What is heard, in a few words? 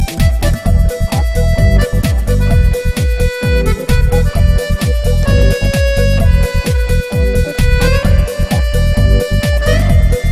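Electric Accordion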